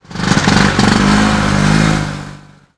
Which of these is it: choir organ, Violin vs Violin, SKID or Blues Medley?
SKID